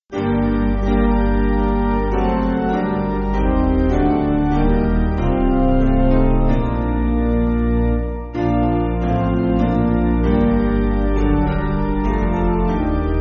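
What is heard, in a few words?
Piano, Backing Files for Churches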